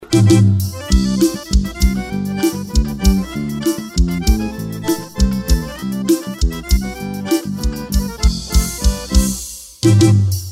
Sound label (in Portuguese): Forró